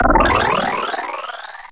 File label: Electro FX; Ueb HE Electro FX